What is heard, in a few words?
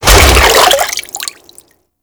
Vay Hek Frame Step Water; Warframe